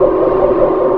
survey progress; Stellaris

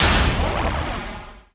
missile fire; weapons; Stellaris

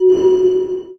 notification; Stellaris

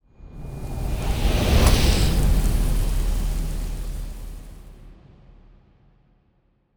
ship colony ship move; Stellaris; toxoids; ships

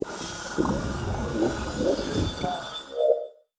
alien signal; Stellaris

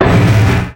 energy lance hit, weapons; Stellaris

